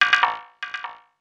FX SHOT TEKNO 140; Techno2; Singleshots

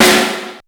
SD BIG, snare drum; SNAREDRUMS

